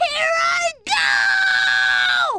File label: shield; chiaotzu